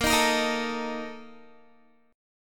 A sharp Major 7th Suspended 4th, Guitar; strummed

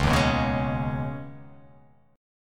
Db 7th Suspended 2nd; JGuitar

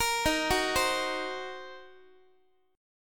D sharp Minor 6th D sharp down Guitar; arpeggiated; JGuitar

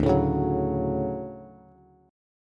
D Major 7th, Bass; strummed; JGuitar

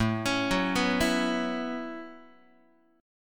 A 6th Add 9th A down Guitar; A6add9; arpeggiated

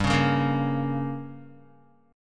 G Diminished, Guitar; strummed; JGuitar

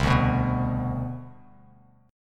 C Major 7th Suspended 2nd, C, down, Bass Standard; CM7sus2 strummed; JGuitar

